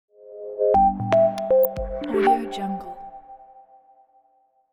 simple soft logo; simple soft ident logo

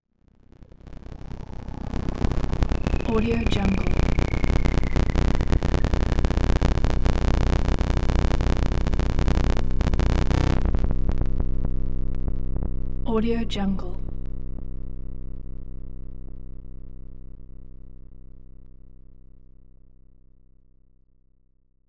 radio static; prosoundfx

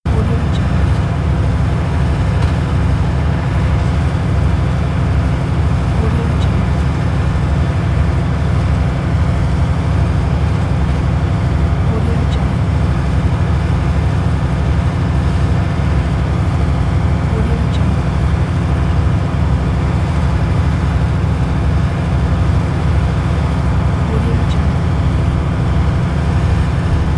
tank idling

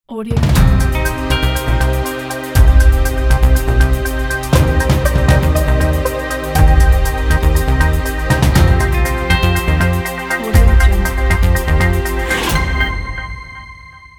news ident

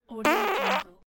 wet fart

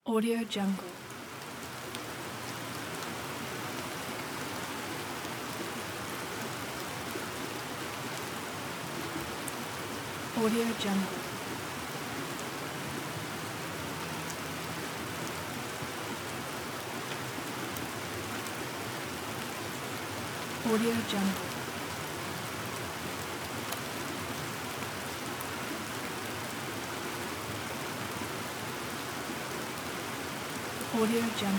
raining

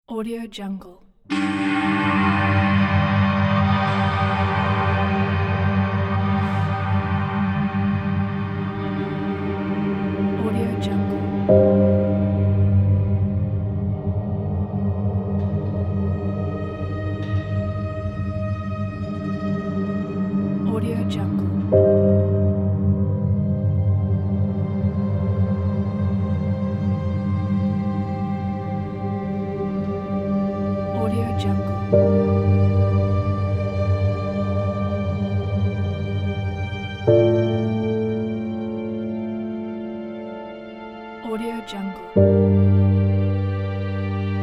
drama piano and strings

